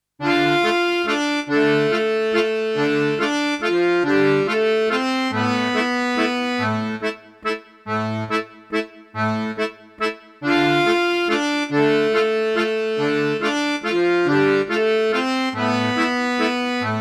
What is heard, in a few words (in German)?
akkordeon